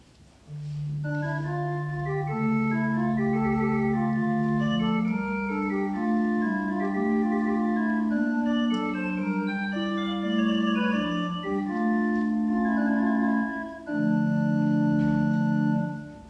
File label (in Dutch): combinatie fluiten; assen